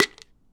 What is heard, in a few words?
Bottle Pop, pill bottles